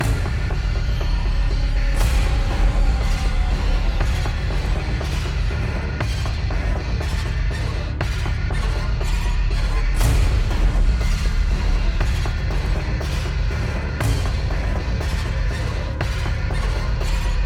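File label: Percussion Music Loop; Boss C2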